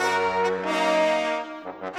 Brass; Sonic Foundry (Sony Creative Software); Orchestral VOL-2; Modern 16

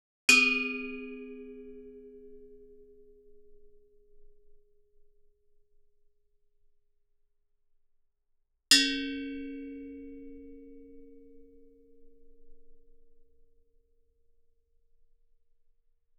Gamelan; Propeller Island - Complete Gamelan

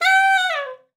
sax g5, Saxophone slides; Session Instruments

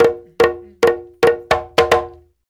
BRKDR, Reggae; Spectrasonics - Supreme Beats - World Dance

